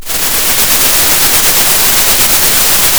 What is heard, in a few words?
Sound of a thunderstorm